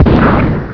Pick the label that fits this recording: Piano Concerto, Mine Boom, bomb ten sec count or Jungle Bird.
Mine Boom